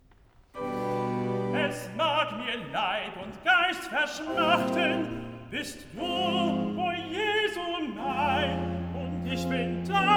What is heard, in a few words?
Recitativo (Tenor); Es mag mir Leib und Geist verschmachten; Bach; Cantatas; BWV 3; Ach Gott, wie manches Herzeleid